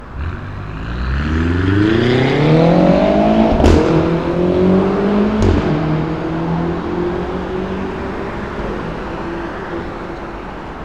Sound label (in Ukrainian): Вибухи вихлопа; Вибухи вихлопа фін